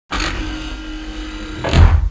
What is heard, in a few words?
door move; doors